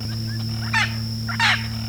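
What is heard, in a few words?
Clapper rail sqwauk; National Marsh Bird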